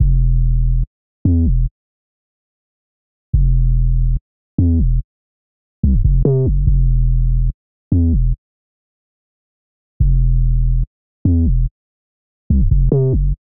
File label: 144 BPM E Min 808, Drill; Cymatics; PARADOX II; Melody Collection; Casket